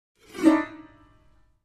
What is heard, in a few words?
Piano Strings, keyboards piano